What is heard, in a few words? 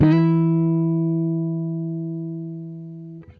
JAZ OCT SLUR, guitar; Quantum Leap - Guitar & Bass